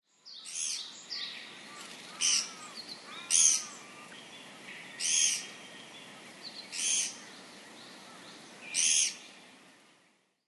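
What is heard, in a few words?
Japanese Pygmy Woodpecker; koe; kogera; utsunomiya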